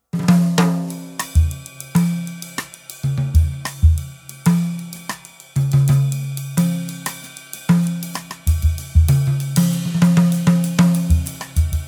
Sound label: ddrum paladin walnut speakeasy kit tight